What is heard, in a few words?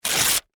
Office Paper Tear Rip; Paper Sound Effects; Paper (Sound Effects:Materials)